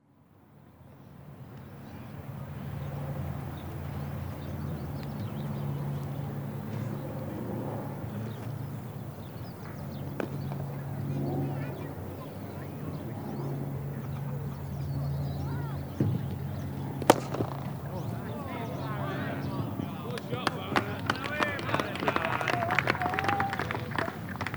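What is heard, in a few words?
Cricket - village match, 'Good shot', 'Out'; 'Good shot' at 0'20'. 'Out' at 0'42'; to be played at low level; The BBC Sound Effects Library; Sport and Leisure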